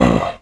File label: get hit, zombie